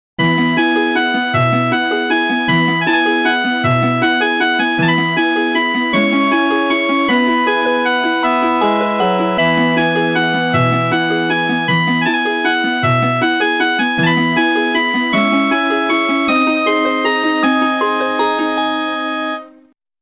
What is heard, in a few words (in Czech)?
melodie